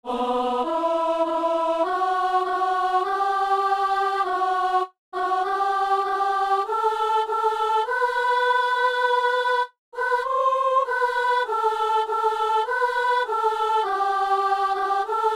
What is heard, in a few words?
soprano; Au coeur de nos détresses